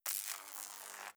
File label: wraith spark; Stellaris; guardians